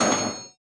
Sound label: cash menu